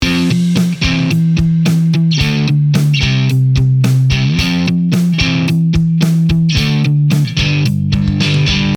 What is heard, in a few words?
drummer normal; guitar